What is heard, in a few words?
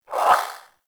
Swing, Weapons Melee; Warframe; Modular Melee